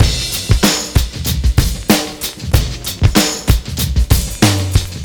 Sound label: banging on, 95bpm